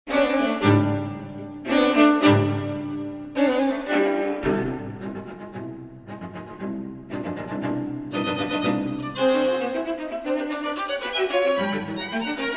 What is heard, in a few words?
string quartet; rathaus